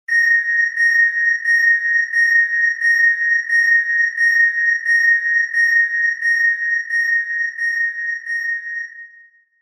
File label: Scanner